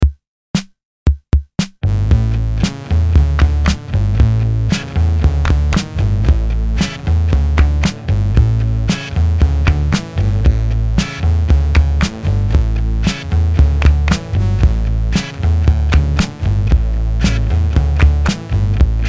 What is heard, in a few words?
instrumental